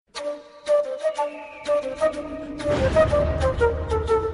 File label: Flute Sms